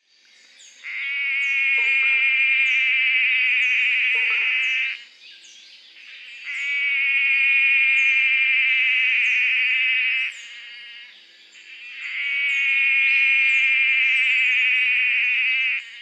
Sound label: Physalaemus olfersii